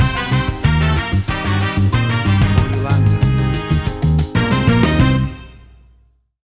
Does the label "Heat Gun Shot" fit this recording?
No